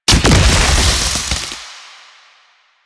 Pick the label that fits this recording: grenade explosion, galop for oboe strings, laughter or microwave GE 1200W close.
grenade explosion